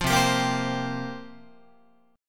C 7th Suspended 4th, Guitar, chord

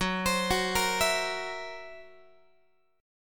Fsharp 7th Flat 5th down Guitar; F#7b5 arpeggiated

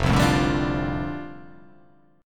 F 6th Add 9th F down, chord

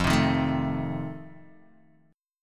F 7th F down Guitar Open D

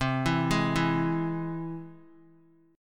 C Augmented down; arpeggiated; JGuitar

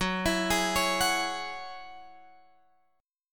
F sharp 7th Suspended 2nd, Guitar Open F; arpeggiated; F#7sus2; x,13,16,15,16,13

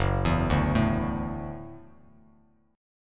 F sharp Minor 7th Sharp 5th F sharp Bass Standard; arpeggiated; JGuitar

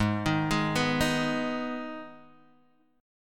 Ab Minor, Guitar, Half Step Down

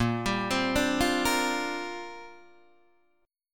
A sharp 6th Add 9th, Guitar Open D

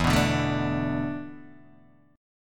E 7th Suspended 4th, Guitar, DADGAD

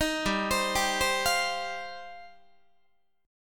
G 7th Suspended 4th Sharp 5th, Guitar

